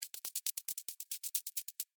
Spider Walk Sand; Animal; Arachnid; Insect; Bug; BlastWave

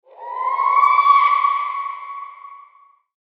Dragon Scream, monster sound effects; fantasy sound effect; Andrew Fleming

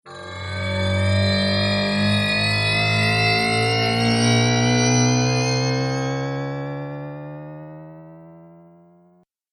Mage On A Magic Motorbike, magic sound effect; Andrew Fleming

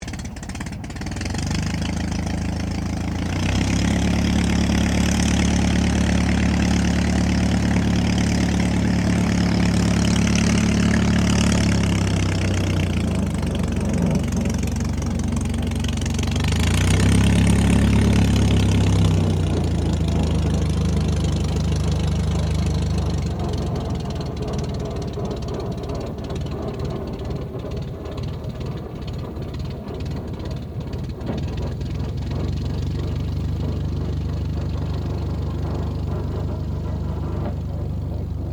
AIRCRAFT DH82A DEHAVILAND TIGERMOTH TAXI; RAAF Tigermoth; Airplanes (Sound Effects:Vehicles)

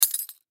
Key Ring Drop; Drop Sound Effects; Foley; Objects; Blastwave

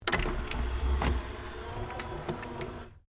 Stereo Akai Mini CD Drawer Close; Multi function music player; Electronics

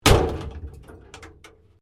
Wreck Door Panel Clunk Metal

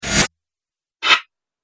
Whoosh Duo Pack; Whoosh Sound Effects; Andrew Fleming

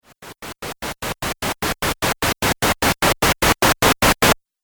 Trailer Riser White Noise; Rise And Dive

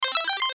BUTTON SYNTH LO FI; Multimedia; Blastwave

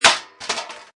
Cartoon Damp Crash, Object fall, rattle impact; Comedy/Cartoon

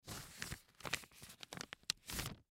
Paper Movement, Paper Fold; Paper Fold In Half; Office; Blastwave

